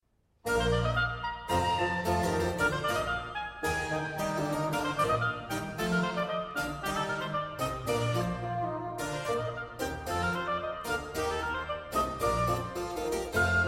Sonata In Bb Major for two oboes, bassoon, continuo; No.3; Mvmt IV